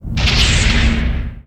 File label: Pickup Scifi; SFX Kit